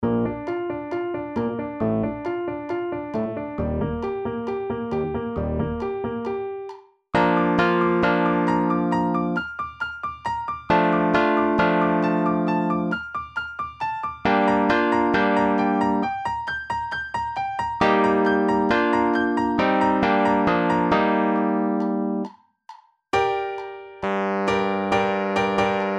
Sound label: Synth Piano Etude